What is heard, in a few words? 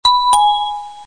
Warning; kyoshin monitor